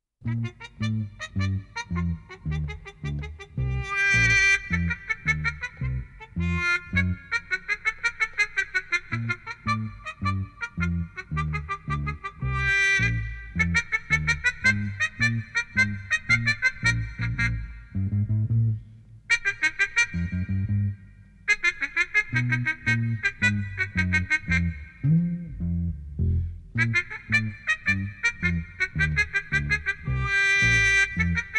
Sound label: perky trumpet